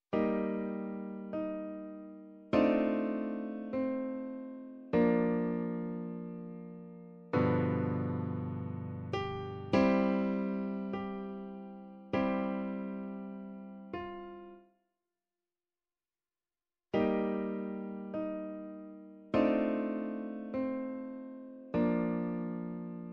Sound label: Recognise 1 Bar Modulation; Grade 4